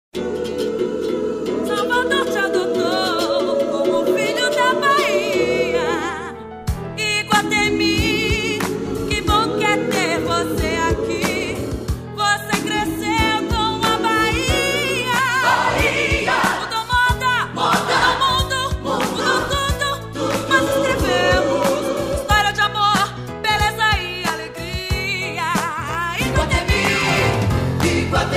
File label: JINGLE; IGUATEMI BAHIA 20 ANOS